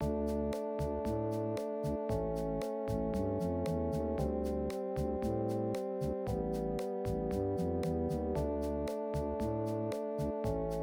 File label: cave; commander genius